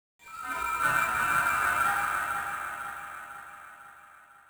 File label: ghost